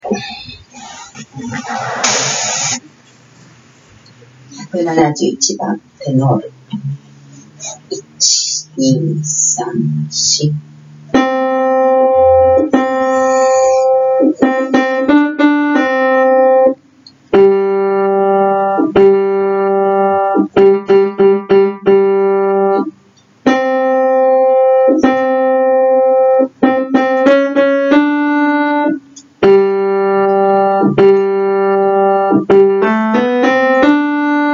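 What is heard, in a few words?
tenor; キリスト集会合唱団・合奏団; 賛美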